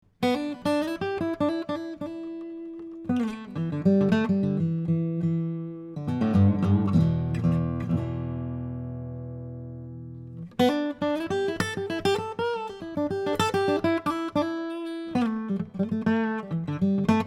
Boogie, Neumann M269

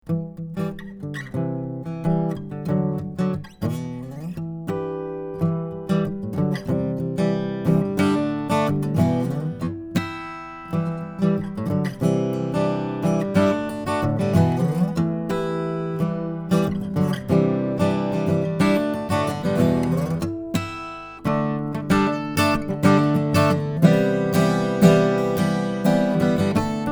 Harmonic, Neumann KM84

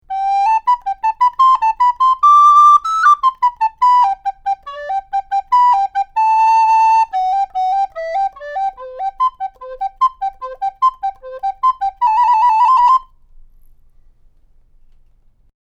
Flute

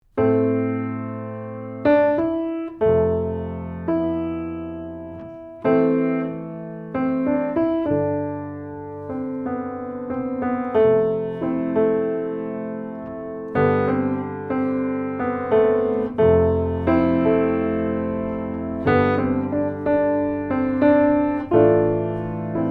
Piano

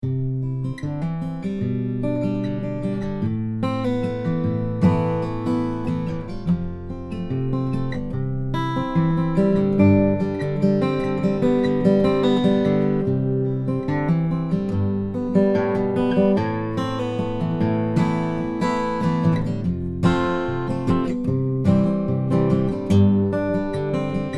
AKG C414 EB O Fingerpicking; SANTA CRUZ OM/PW AC. GUITAR; OMNI; No EQ or effects

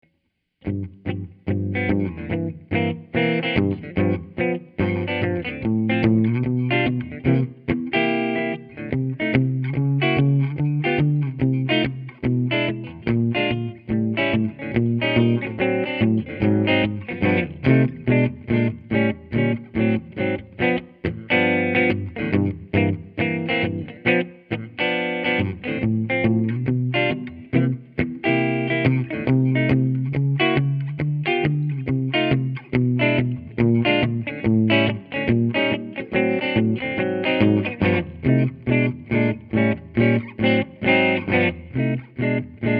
SG Supreme Jazz, Gibson; Sony PCM D1 flash recorder; electric

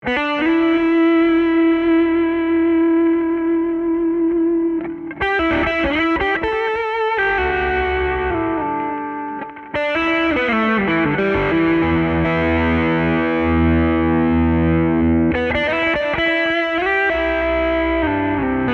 Boogie, Gibson ES336; Rock Boogie / (Original)

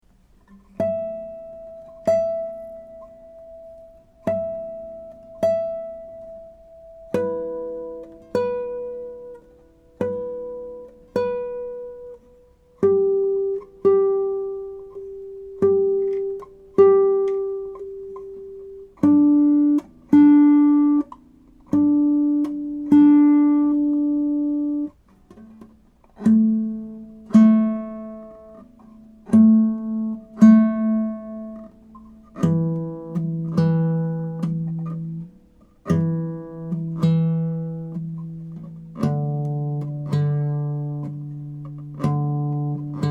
Intonation; schoeps cm61

